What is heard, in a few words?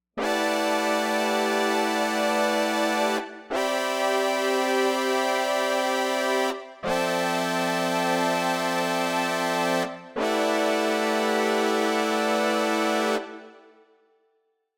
richSynth; Adaptiverb